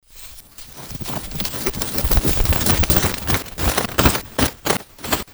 PANIC SIX, Disjointed Textures; USB Soundscan vol.33; AKAI; CHAOS

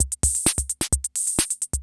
CR78; Best Service; XX Large Most Wanted; Sample CDs

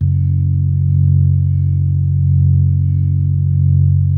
B3, First Call Vintage Keyboards

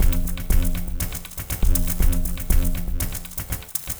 Candy Machine, Processed Percussion

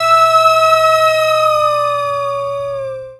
Ambient FX, Ambience FX

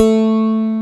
A2 STRAT, Bass Guitar; AKAI S6000 CD-ROM - Volume 1

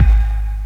KICK, Hip Hop

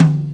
MidTom, Tama RockStar

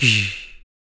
PERC, MALE PERC

